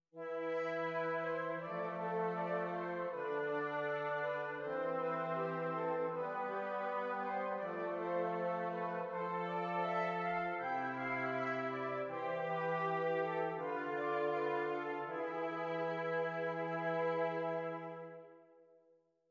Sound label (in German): Stimmiger Contrapunkt; Ronge